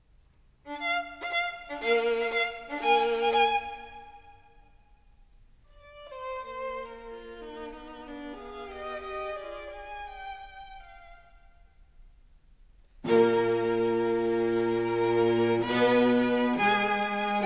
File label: Fugue for 2 Violins, Viola, and Cello in B-flat major Allegro; String Quartets; Beethoven